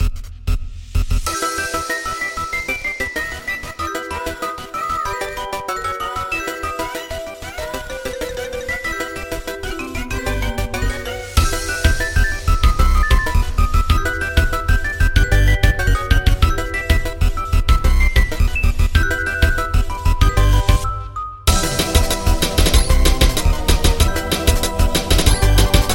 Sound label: Corrupted Hero (Remix) (Instrumental); Friday Night Funkin' Pibby Apocalypse